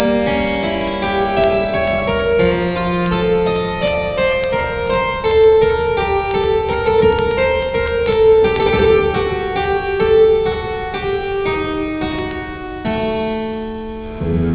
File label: Piano